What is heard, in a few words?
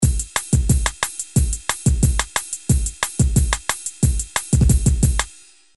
bossa